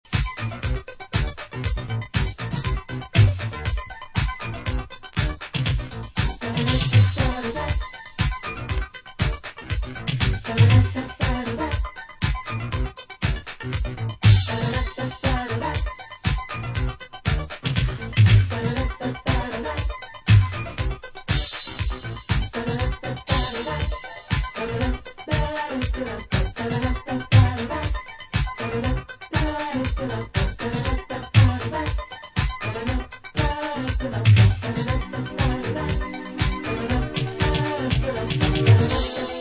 Instrumental

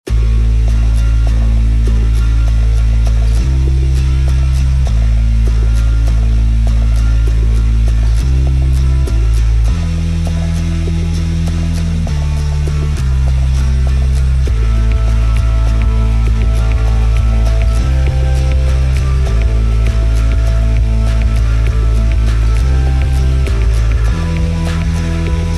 Rock (Acoustic Version)

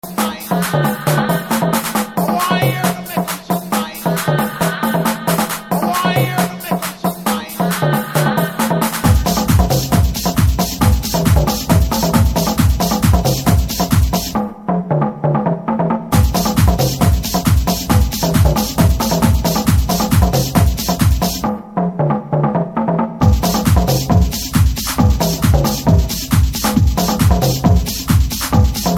Tribal Tone

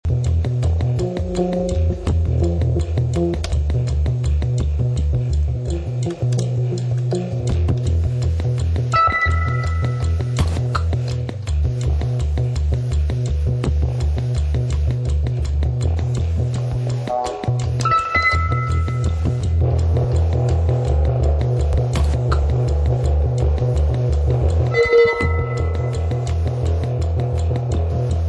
flute

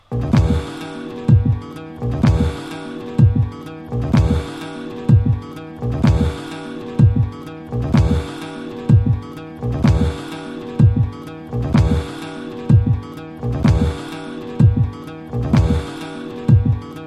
spanish heartbeat; Disco

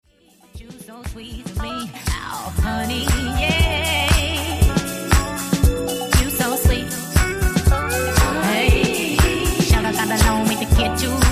vocal

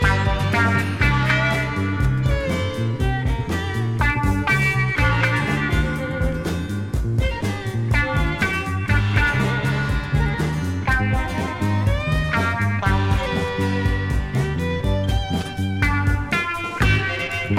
Blue Guitar

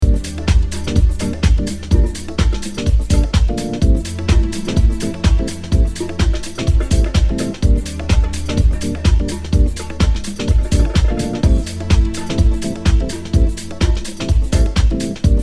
Funk N The Drums

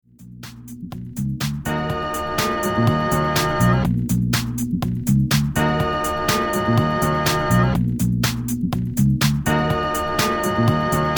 Instrumental

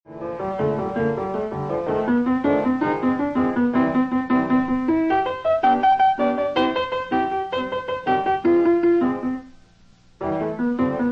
Piano Solo; Honest Jons

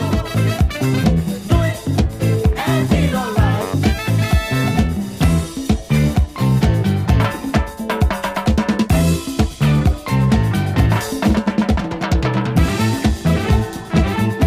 Steelband Disco (vocal)

